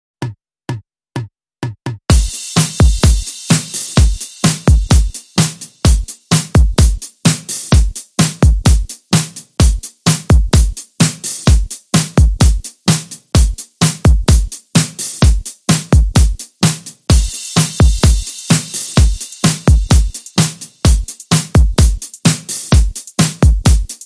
Beat

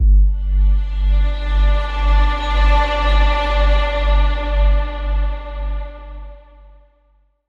Link Sting

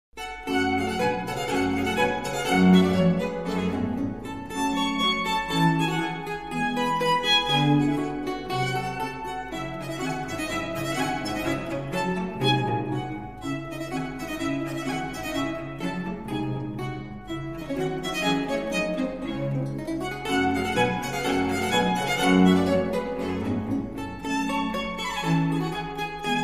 Trio for lute violin & bc in G minor; Antonio Vivaldi

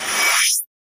Sharp Transition

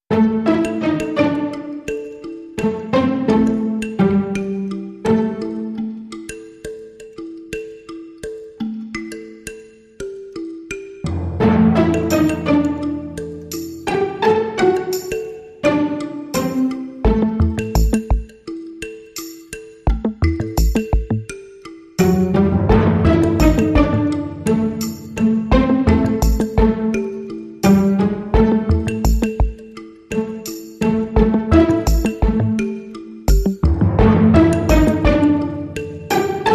Dirty Dramedy Swag No Drums

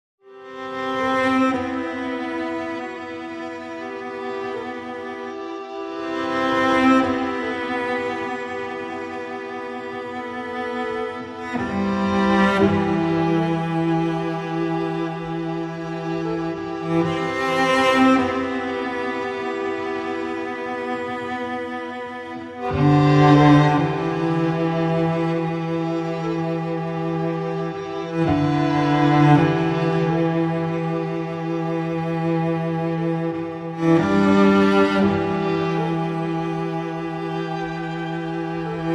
Strings Only

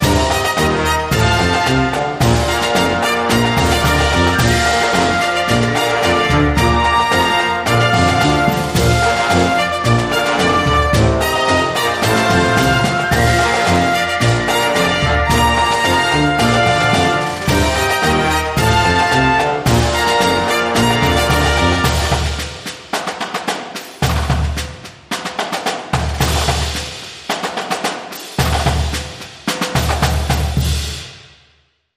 Halftime Show

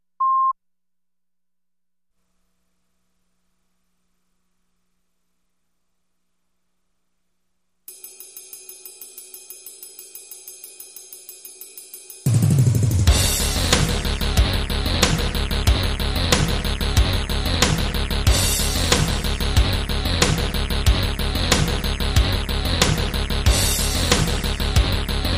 Drums & Bass

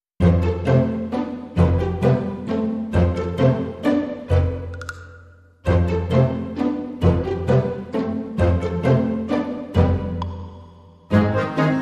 Waltz

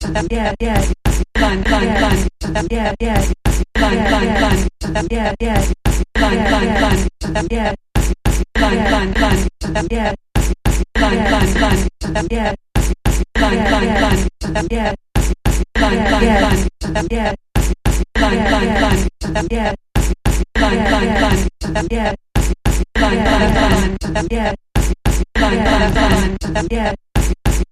Sampled Voice Percussion Variation Long Version

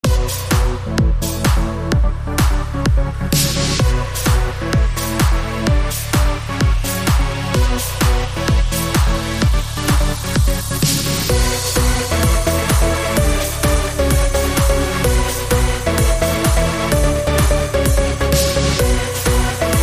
Boost the Bass

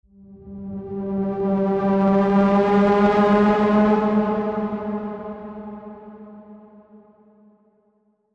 Distant Bend

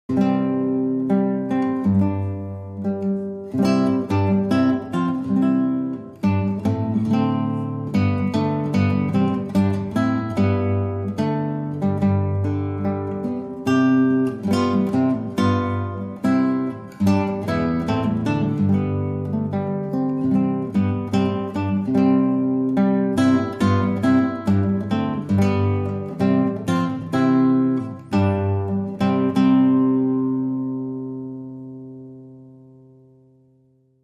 Solo Guitar

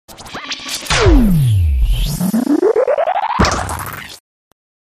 Landing & Take Off

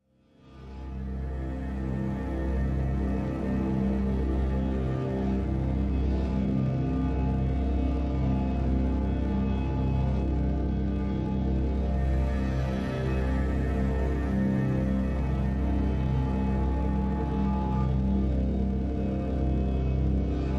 Slow, Drones Only

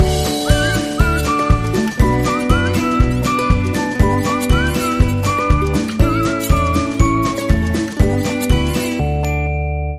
Intro Whistling

